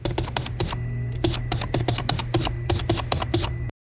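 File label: scrolling digital letters; cash register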